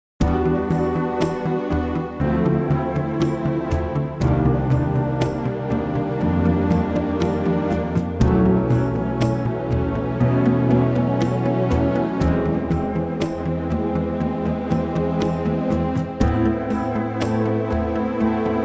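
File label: orchestra A minor; key - Am (C) - vocal range - B to C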